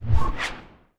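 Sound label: elephant large, weapon; Battle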